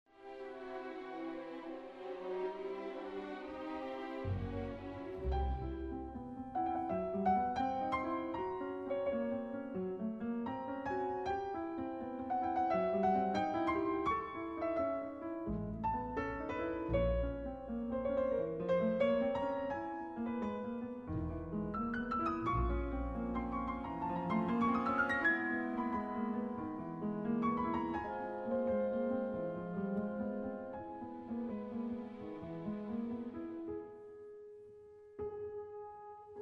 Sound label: Piano Con